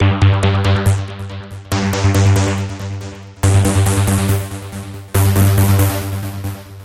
Synth Arp Bass

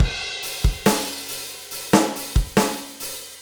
Drum Rock

